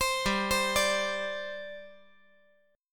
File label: G Suspended 4th